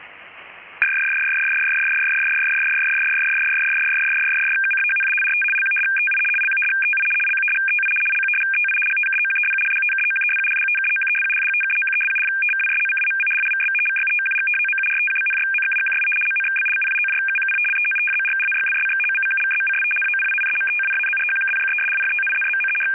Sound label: RATT 75 850; 75 Bd/850 Hz; Royal Air Force/Royal Navy MATELO; NATO ENCRYPTED RATT